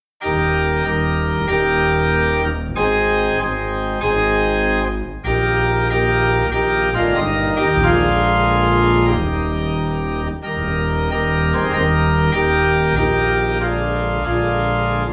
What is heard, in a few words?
Organ Low in E Flat